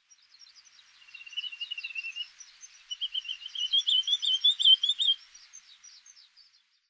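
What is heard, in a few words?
grey-tailed tattler, tatsuno; kiashishigi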